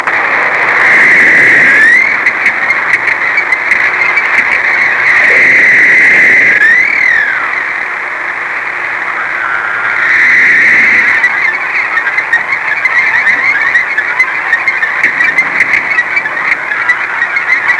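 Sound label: sperm whale, wale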